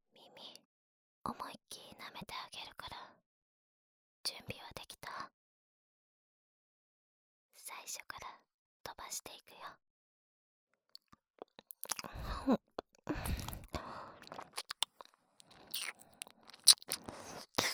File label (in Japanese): 耳舐め 左耳, ASMR